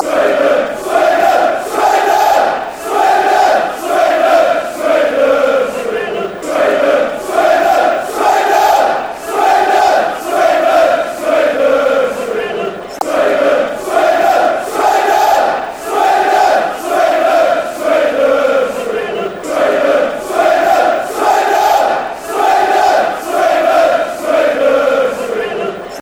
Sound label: swindon swindon swindon, fanchants